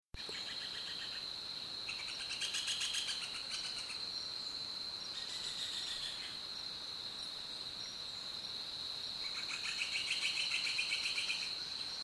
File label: melanerpes striatus los haitises national park dominican republic; West Indian